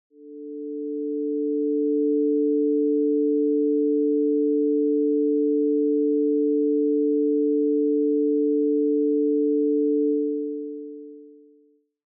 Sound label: Sine Wave; ProTools